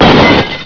Verre; Bruitages

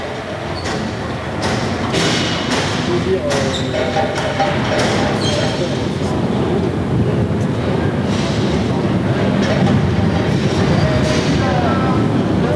indus; MOBICLIC 13

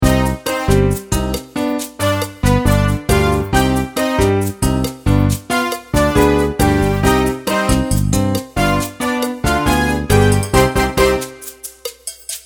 salsa; MOBICLIC 64

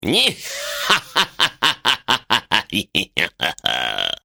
Rire; MOBICLIC 77; ZOOMZOOM

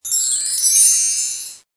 bruit; MOBICLIC 62; ZOOMZOOM

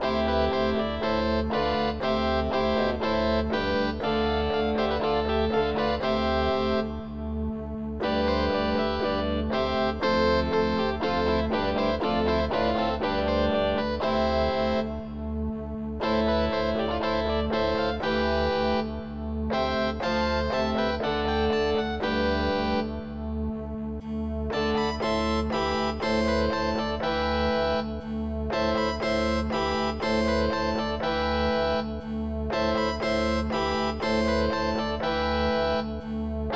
basse; Gold Medal Software - Volume 3 (Gold Medal) (1994); music

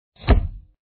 bulle

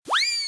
tombe; MOBICLIC 125

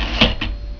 Retour; Mosaïcon

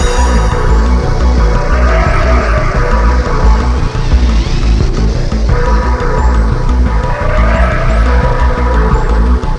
musique; MOBICLIC

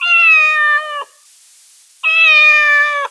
chaton; MOBICLIC

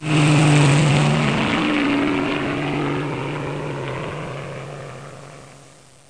Avion; Mobiclic; Jeunoel

